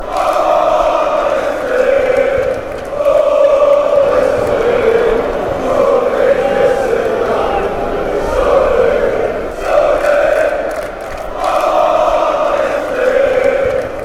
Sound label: ohh, chants